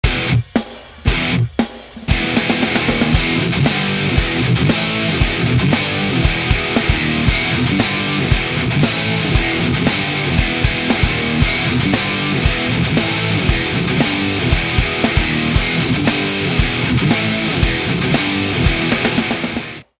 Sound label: Bogner Uberschall Gain